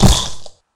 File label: crunch